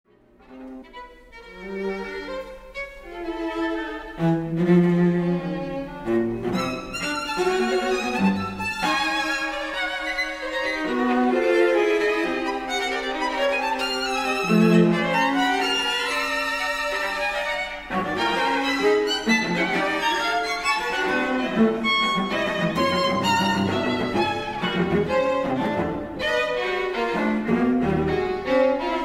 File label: music